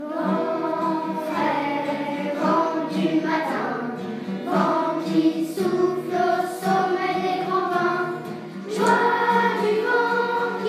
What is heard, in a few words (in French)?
chant vent frais